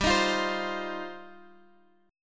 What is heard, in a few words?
G 7th Suspended 4th Sharp 5th G down Guitar Standard; G7sus4#5 strummed